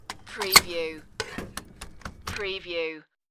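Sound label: Gas Pump Back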